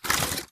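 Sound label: Tick Movement Servo Walk; Titanfall 2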